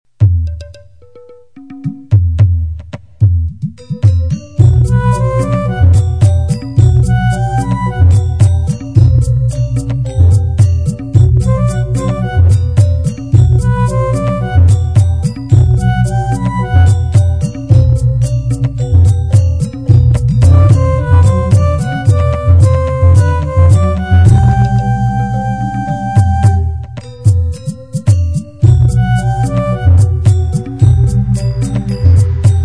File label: degung